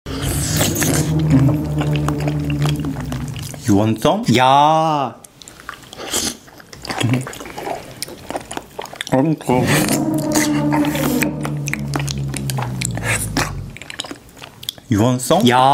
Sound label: eating jelly